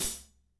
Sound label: hat, warm ballad; Spectrasonics; Burning Grooves CD2